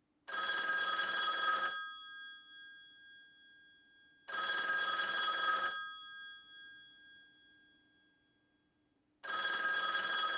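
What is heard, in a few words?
1970 Telephone Ring Netherlands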